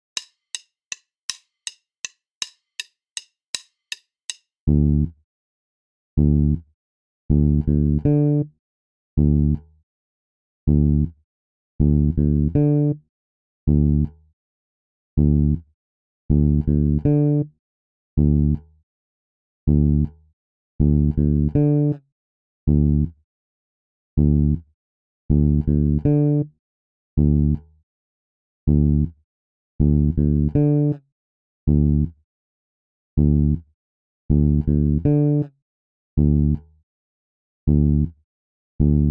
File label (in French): decalage triolets exercice basse tempo 080; exercices